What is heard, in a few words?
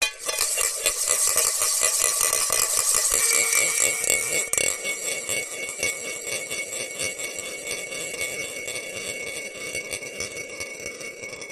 industrial ball bearing metal small spin in metal pot